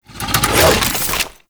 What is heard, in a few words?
Quad Sniper Reload Spin; Warframe; Weapons; Tenno